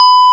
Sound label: FLEX SOLO, K1 KAWAI; East West; Global Sounds